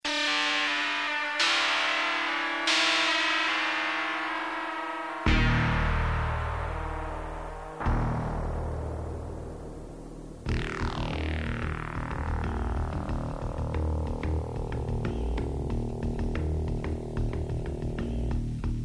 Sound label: ELECTRIK SHOCK